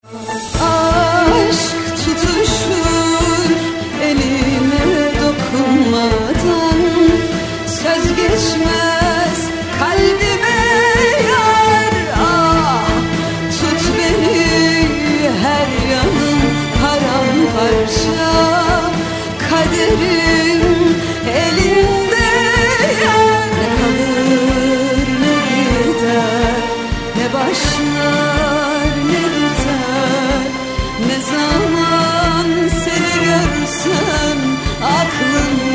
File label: Ringtone Singer